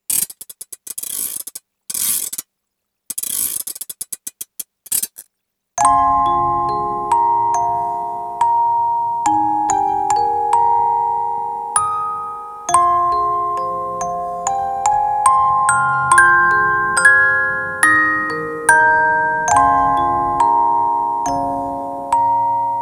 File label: Orgel; SORCER STRIKER -Orgel A; mahou daisakusen ps4 gamerip 2017